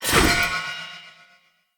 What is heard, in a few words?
Short Sword Throw Perfect; Weapons; Player